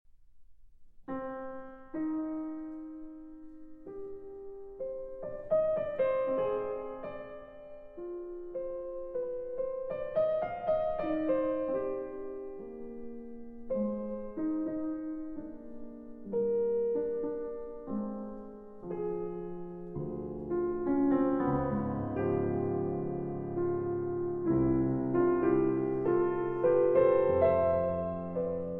Pieces For Piano Solo, Serenade; Three Pieces For Piano Solo, Op. 204 I.

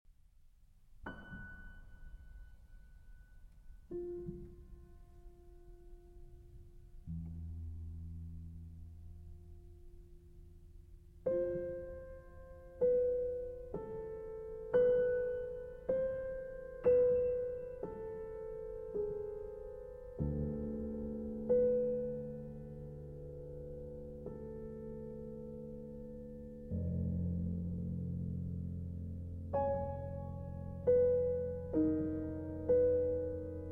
Grand Piano

